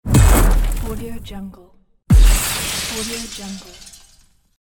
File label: car accidents; volkovsound